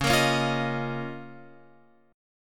Db 6th Add 9th Db down Guitar Open F; Db6add9; strummed

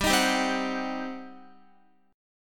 G Major 7th Suspended 4th Sharp 5th, Guitar; GM7sus4#5 strummed; x,10,13,12,12,13

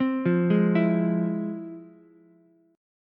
F Minor Major 7th, Ukulele, chord; FmM7 arpeggiated; JGuitar

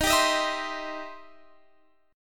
E Minor Major 7th Sharp 5th Eb

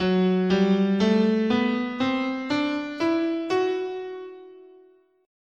Locrian, scale, guitar

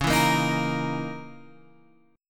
C sharp Minor 6th Add 9th, Guitar Open G; 11,9,8,8,11,8

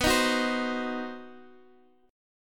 C Diminished B, Cdim/B chord; strummed; JGuitar

